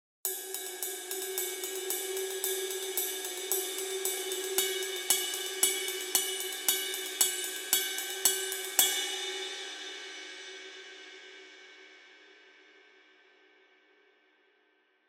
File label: Ride 20; JazzMaster